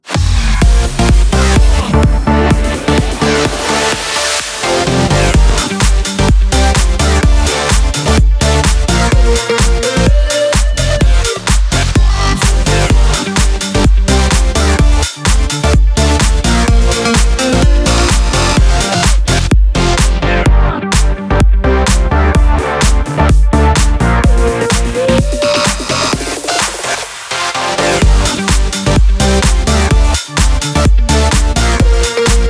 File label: Power Opener; SWR3